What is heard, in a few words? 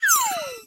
cash stack sym fall; vegas night life